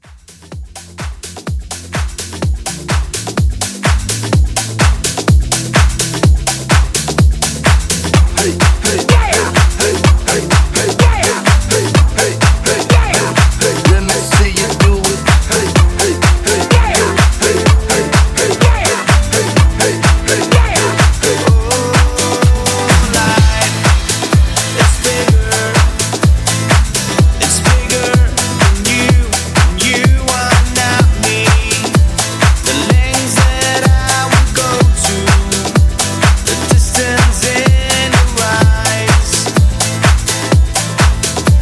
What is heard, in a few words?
House Mixshow; 2024 House Mixshow Edit